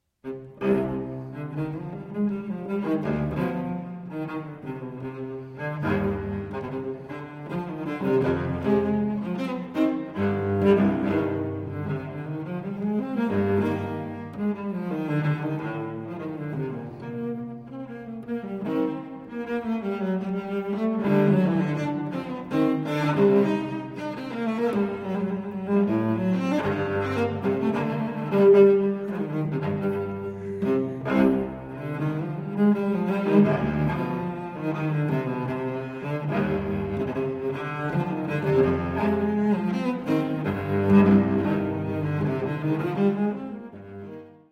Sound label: Unaccompanied Cello Suite C Minor Courante; BWV 1011